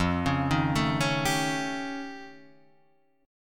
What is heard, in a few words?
F Augmented 9th F, chord; F+9 Chord {1 4 1 2 2 3} arpeggiated